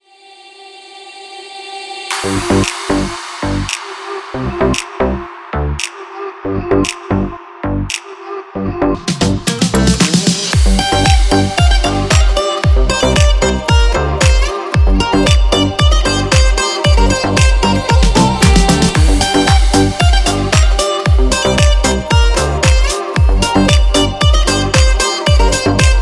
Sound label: deep house; by burak sound bank; deep house vol 4